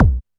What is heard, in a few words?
DM5 Kick, Alesis DM5 drum machine